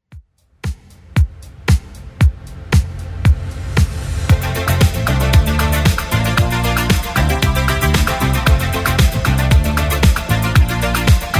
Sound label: 80s Redrum 115bpm; remixplanet